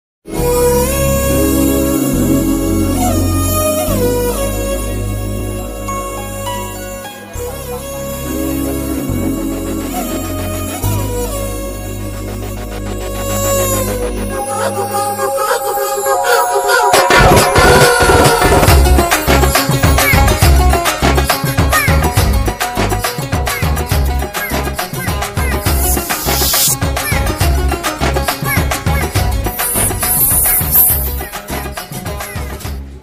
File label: love bgm; vettaikaran; vijay